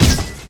Gas Bomb Discharge; Destruction

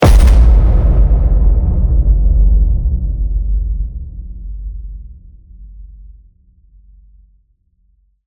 HIT SciFi Low, Cinematic Hits Designed

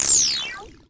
Pickup Magic; SFX Kit; Pickups

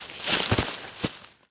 Bodyfall Weeds; SFX Kit; Bodyfalls

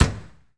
Mustang GT350 Trunk Close, Automobiles

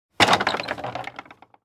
wood gate; Inquisitor 2

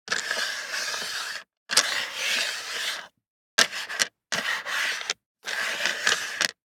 DICE on Plastic, Slide, Standard, 6 Six Dice; Samples; ArticulatedSounds; DICE Sfx Party (Extended)

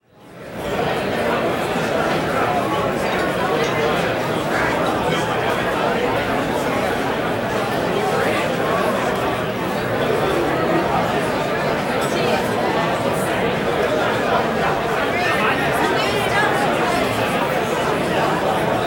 Bar Pub Crowded Beer Hall, Walla; Sound Ideas; Walla Series

